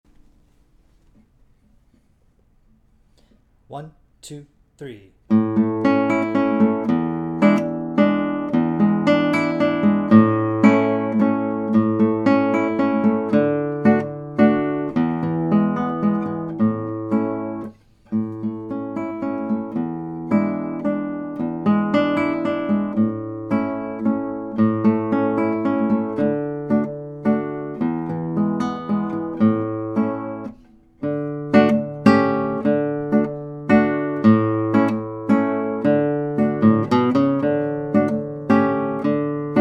Mazurka 112bpm; Mazurka (Duet) by Francisco Tárrega

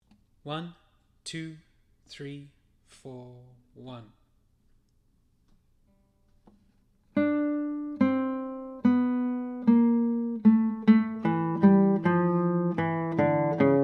Bass; De VIsee

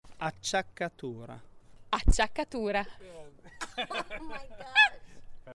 Acciaccatura, classical guitar

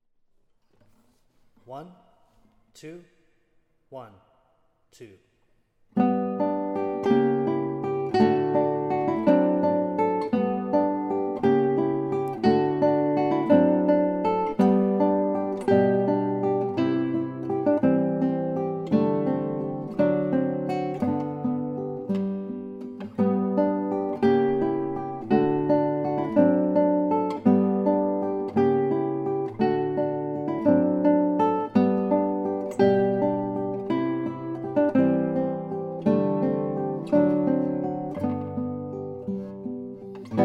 both guitars; Schumann; Kinderszenen

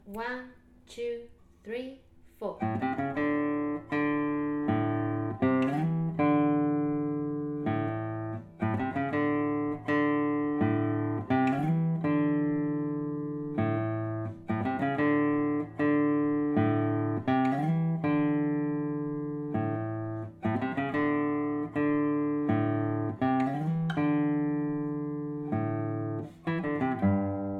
Guit

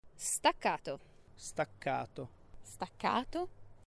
Staccato

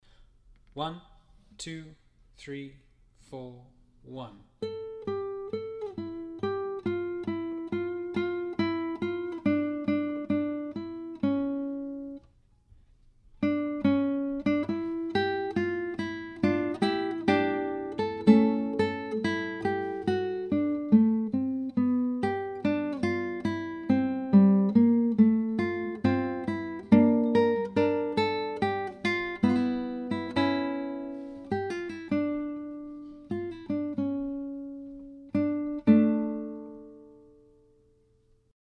Melody, guitar; De VIsee

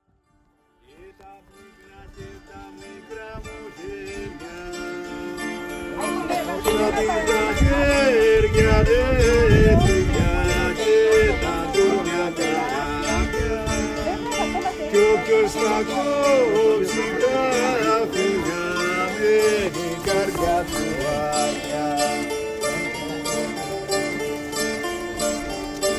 Greek Guitarist

